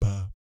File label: "bap" G2, Vocal Jazz Fx; Spectrasonics; Vocal Planet Vol.3 Jazz Fx